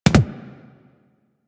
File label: Weapon Punch Double